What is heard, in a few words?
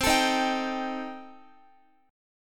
C Minor 6th Guitar, chord; Cm6 strummed; x,x,12,14,15,15